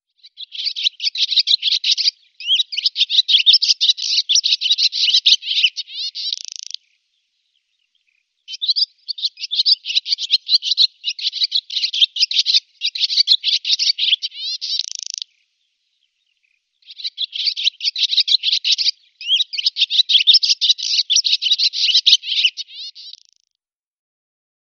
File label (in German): Lockruf Rauchschwalbe Hirundo rustica